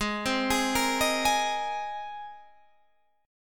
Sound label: G sharp Major Add 9th, Guitar Standard; G#add9 arpeggiated; JGuitar